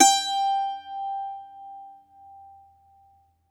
MANDOLIN, Plucked Strings; E-MU Producer Series Vol. 7 – Old World Instruments

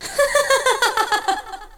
FX; AMG Megabass REMIX! VOL-2

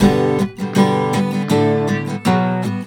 EMGTR; USB Soundscan vol.42; AKAI

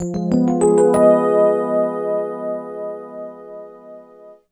EP, Keyboards, Electric Pianos

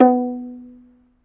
AFRO HARP B4; AFR. HARP2; Heart of Africa

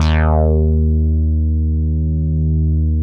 MINIMOOG; Big Fish Audio - First Call Vintage Keyboards

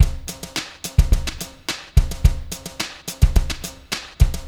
Wimpy Brush Funk 107, Lounge Drumz

